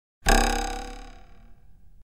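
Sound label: spring sound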